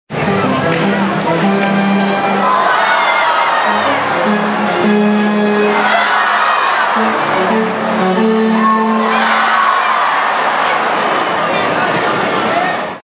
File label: Baseball organ and croud